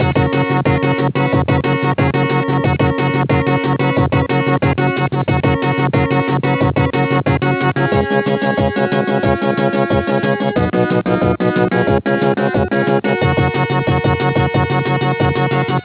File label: adlib, pc; Turbo Outrun